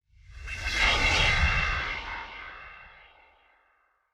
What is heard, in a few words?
Super speed dash fading